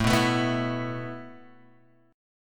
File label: A Suspended 2nd A Guitar Open G